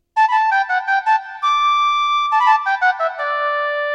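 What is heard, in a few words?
flute; fleita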